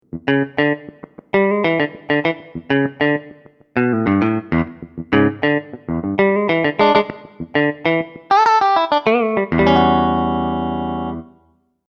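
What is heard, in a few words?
clean guitar; juno-gi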